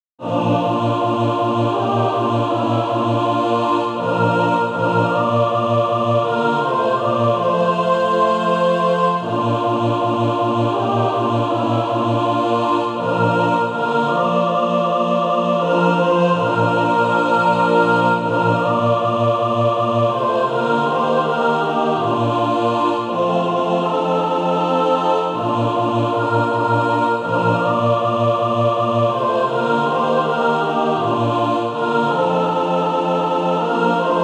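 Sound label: mixed, SATB, Practise Music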